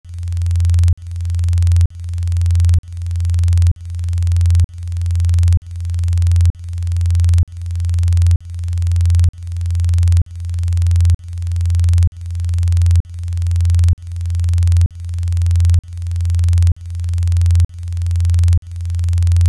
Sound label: testsignal; vamp-plugin-sdk